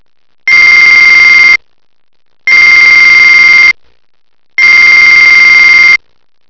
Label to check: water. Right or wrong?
Wrong